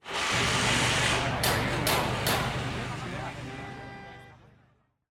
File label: build city; OpenFrontIO